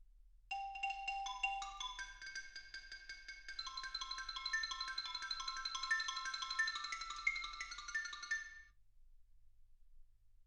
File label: xylophone